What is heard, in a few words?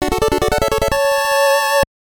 Level Up; Fire Emblem Ankoku Ryuu to Hikari no Ken